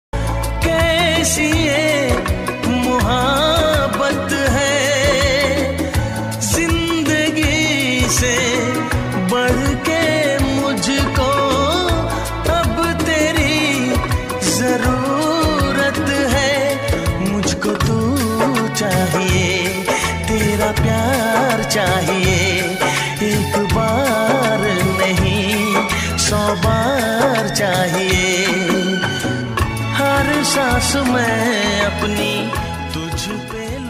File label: Sad Old Hindi Song Ringtone